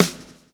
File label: LASH, Backbeat